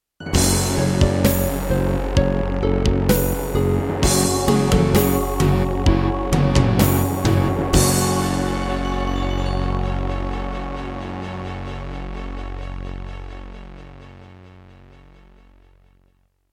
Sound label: Roland SC-55mkII; Doom (Sigil); Introduction